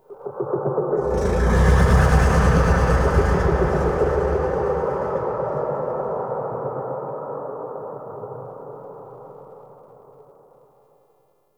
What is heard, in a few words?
Sentient Devourer Moan Exterior